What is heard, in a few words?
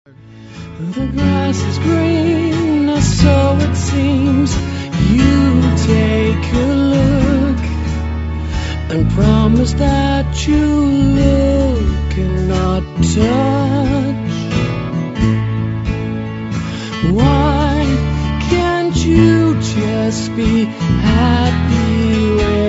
song